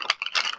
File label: Mannequin Hit